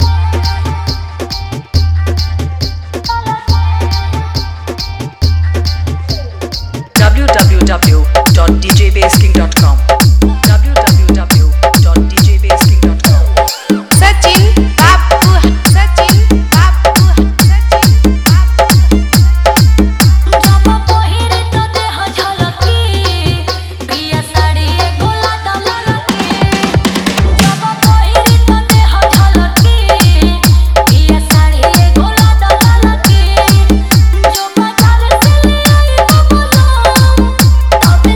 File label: Hard Vibration Bass Mixx